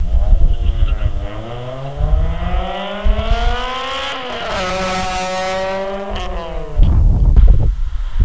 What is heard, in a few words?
outside drive by, Exhaust; Kazuma